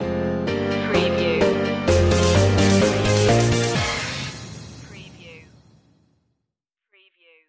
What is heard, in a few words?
bass addiction stinger